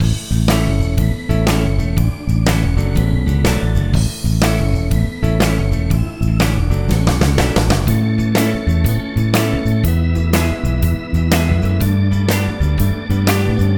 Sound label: encounter waiter; Manamon Music; Manamon 2